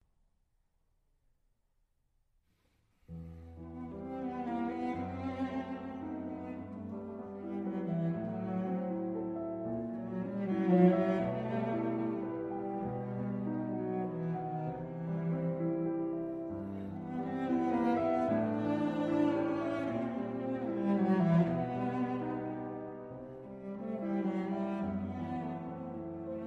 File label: Slavonic Dance In E Minor Allegretto Grazioso; Dvorak; Op 72 2